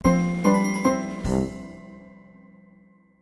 Old Steps

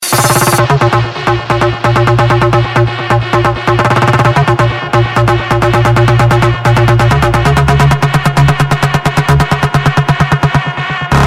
Electro Speed

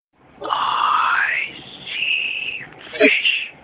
Fishy Tone

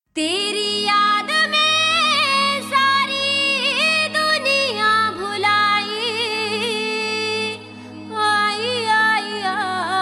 Punjabi

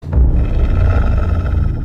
Growling; Wolfteam

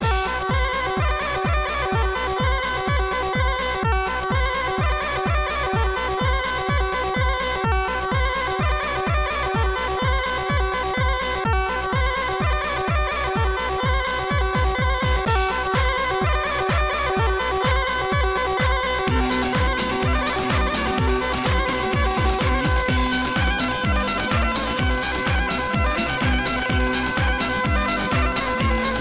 Hot Balkan Tone

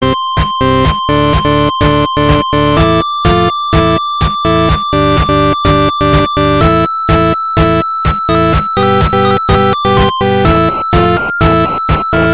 8 BIT Ahhhh

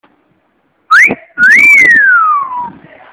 Flirty Whistle

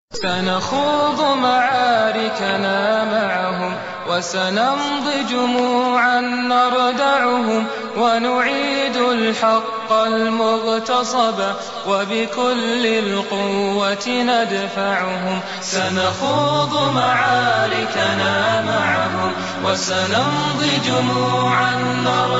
Nasheed

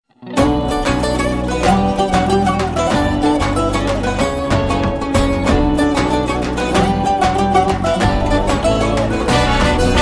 Turkish Dance

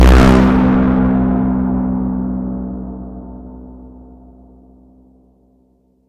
Distorted Boomer

